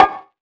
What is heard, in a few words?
D&B Bongo, Drum & Bass Kit; essential drumkit